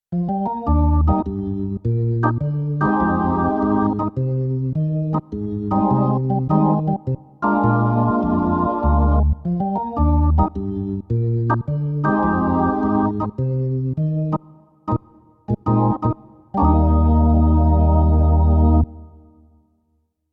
Yamaha DGX670 Jazz Organ

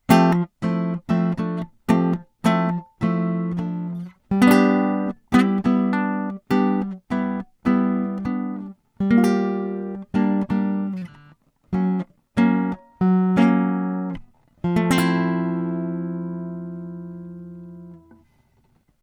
Takamine 135SC Latin

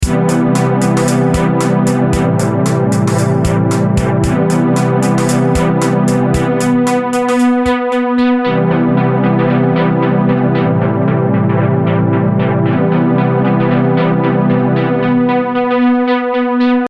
Pad FX

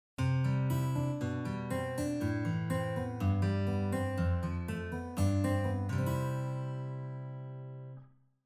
Guitar Room

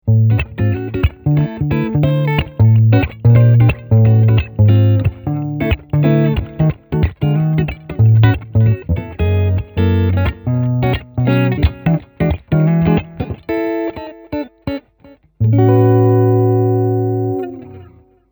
Boogie n Jazz

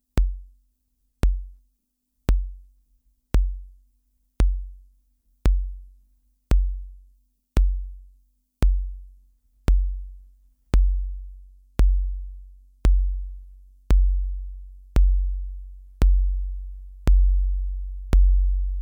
BD Decay

